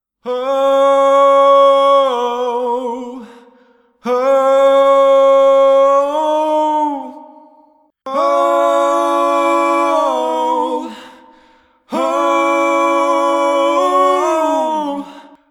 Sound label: singing test